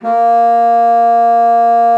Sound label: A#3, bassoon; Xsample VOL-6